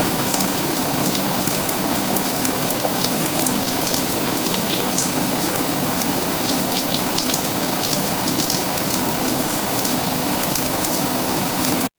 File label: ambience rain